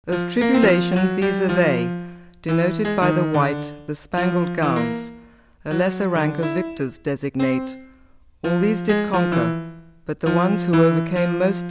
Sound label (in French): voix piano